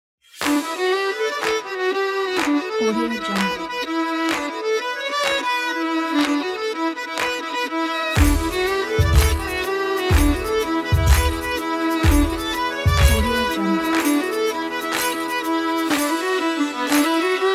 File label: sailors dance; musicdog